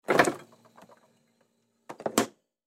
minibar refrigerator open close

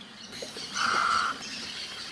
Snowy egret

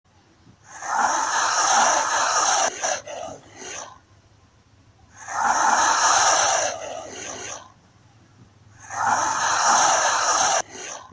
Komodo dragons sounds like asmr sounds

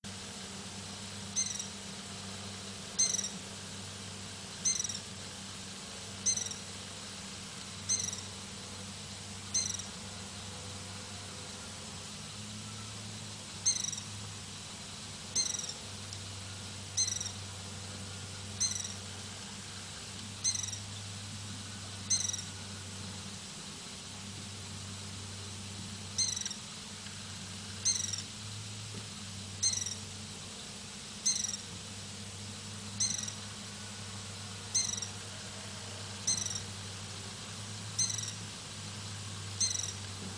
Brazilian ruby; پرنده یاقوتی برزیلی

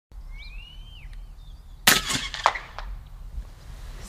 pcp gun; اسلحه پی سی پی

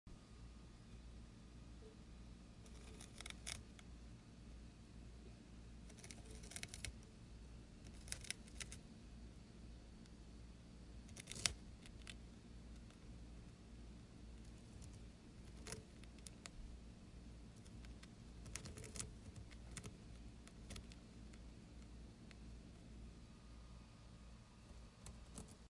hamster eating crunchy bread

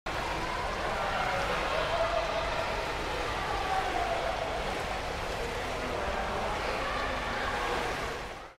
Pool environment; محیط استخر

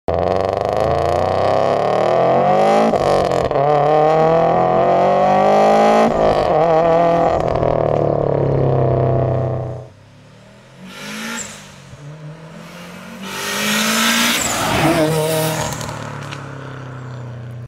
subaru

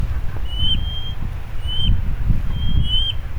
black storm petrel

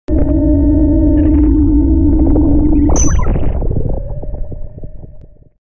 Abstract environment

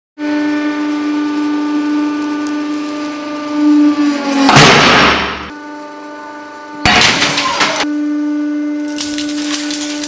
press machine

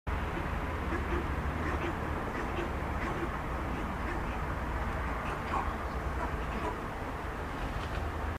Northern bald ibis; پرنده اکراس سرتاس شمالی

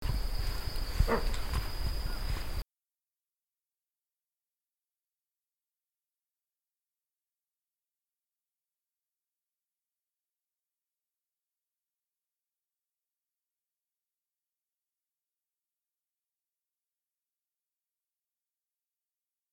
Sira curassow, پرنده کاکل فری سیرا

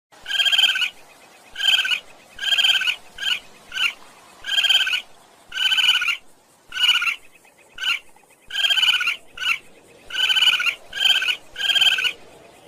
Pangolins; پانگولین

